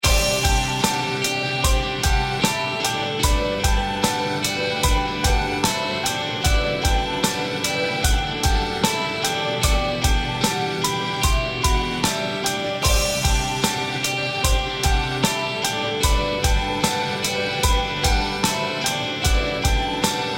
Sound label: popsrock BPM75